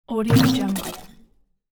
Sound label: arcade game bit stutter